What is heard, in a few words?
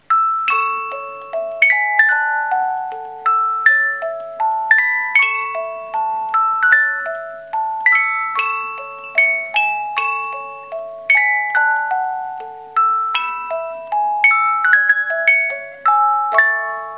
18 lames, boite a musique; Boîte à musique en bois personnalisable.